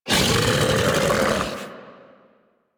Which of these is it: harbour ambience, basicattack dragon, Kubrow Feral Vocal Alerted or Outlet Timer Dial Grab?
Kubrow Feral Vocal Alerted